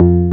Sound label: Synth Bass (F)